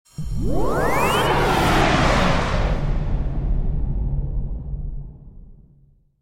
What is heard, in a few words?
Electric Fly By, Stingers; iLife Sound Effects